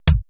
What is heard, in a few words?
80s Drum Set, drumcomputer e5